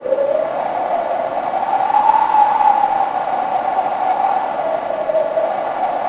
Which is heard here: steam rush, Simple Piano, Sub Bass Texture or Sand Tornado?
Sand Tornado